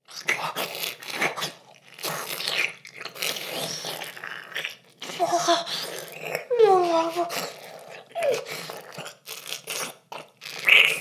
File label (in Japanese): フェラ音声; ダメなメイド